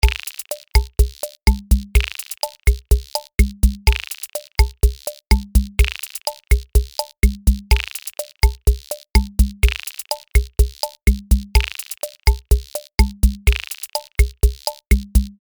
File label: combo; tiny pops; superwave